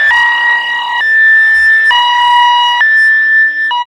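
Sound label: Interference, Hard & Loud Techno; USB Soundscan vol.01; AKAI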